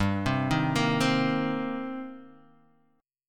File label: Eb 6th G